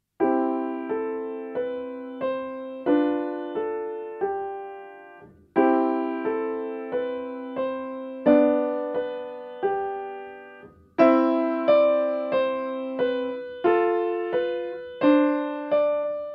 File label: piano; Anton Diabelli; bagatelle